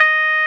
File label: Roxichord, E.Piano